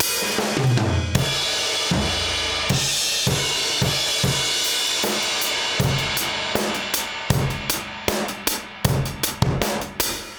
DOWN KIT 78; AMG Gota Yashiki Groove Activator

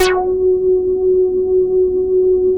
JUNO 106; Masterbits; Vintage Voltage; SEQ-FX

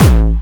Jump Kicks, Bassdrums

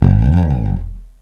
UP BASS, ELEC BASS; East West - Global Sounds

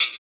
RSS, 160 BPM; Ueberschall; Drum & Bass Flash Trackz

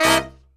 C BRASS; E-MU Formula 4000 Series Vol. 1; Hip Hop Nation; Booty Brass

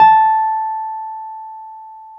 PNO, Wurly

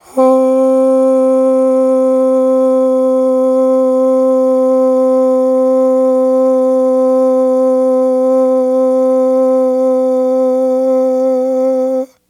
AHHH, Vocal; Polyend

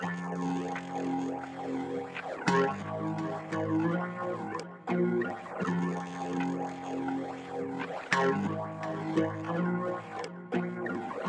GTFX, Hip-Hop & R&B; USB Soundscan vol.47; AKAI

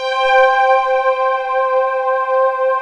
SOUNDTRACK, ROLAND D-50; Rubber Chicken Software Sample Bank

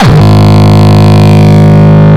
Longkick, kicks

